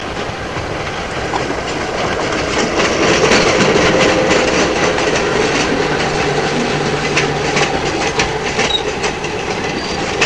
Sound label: Train Passing Slowly